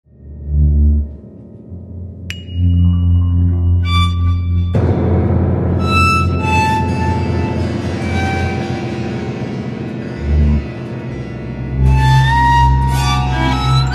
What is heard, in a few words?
orchestra